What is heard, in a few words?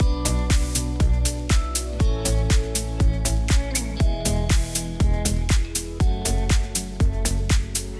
HE2 Legacy High; Cranborne Audio